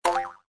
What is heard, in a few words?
spin button, slots; Sugar Town